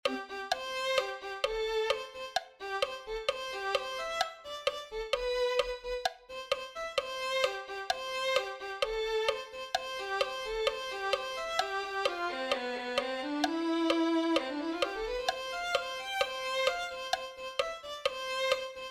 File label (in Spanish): violin2